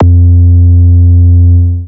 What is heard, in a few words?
808 one shot F; TROPKILLAZ